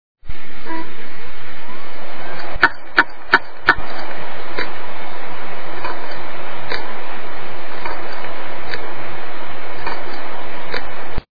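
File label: seagate laptop clicking, hard disk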